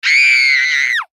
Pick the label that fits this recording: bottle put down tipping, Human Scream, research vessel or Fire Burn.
Human Scream